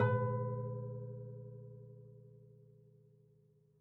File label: Classical Guitar Marimba Chunks; Classical Guitar Extended Technique Stereo